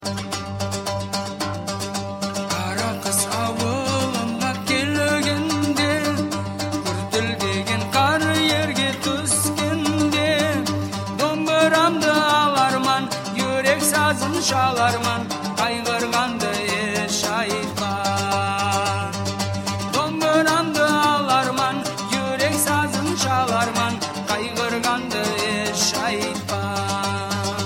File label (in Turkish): Dombra zil sesi